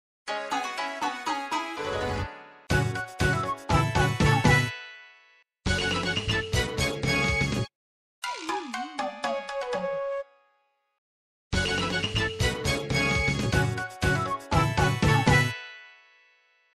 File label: Jingles; Kat and Ana; WarioWare Gold